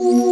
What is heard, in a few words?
Ominous Wind; Front Mission - Gun Hazard